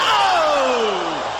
oh

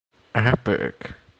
epic

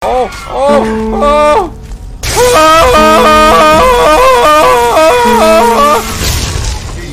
battle cry

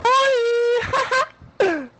oooooooiii haha

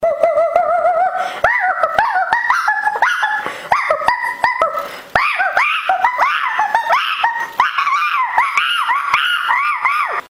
funny monkey noises

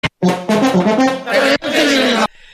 trumpets lol

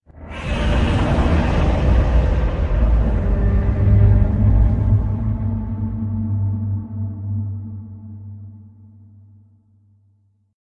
godzilla roar distance; 2014 legendary

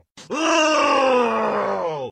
death

